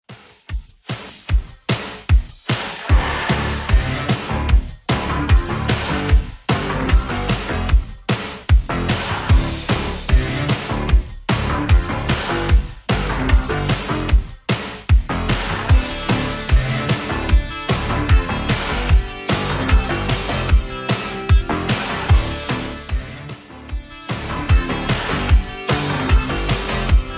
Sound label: 80s 150 bpm; Redrum